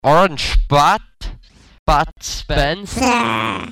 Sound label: voc